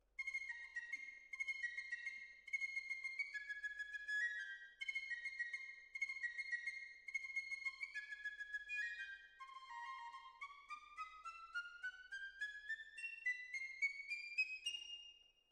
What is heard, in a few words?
Decca, Jazz for piano